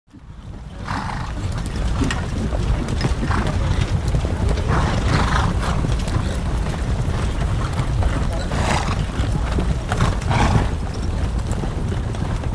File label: Horses Work Trot; Historical; Hollywood Edge Historical Series; War Horses Armies & Weapons